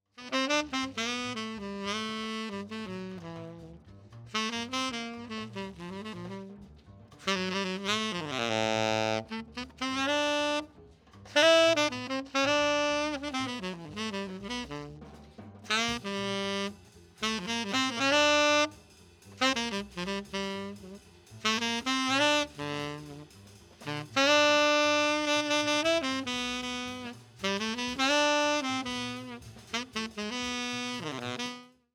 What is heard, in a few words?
Sax Neve Silk M49